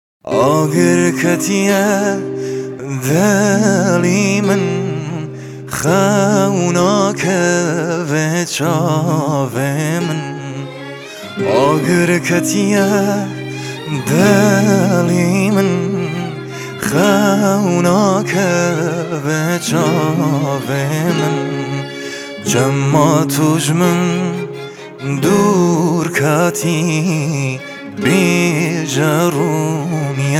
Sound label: Guitar